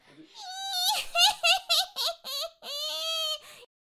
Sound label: Pumpkin Catapult Attack Vocals